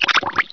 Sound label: hatchet sight; gearbox